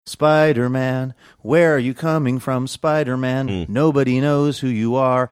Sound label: singing where are you coming from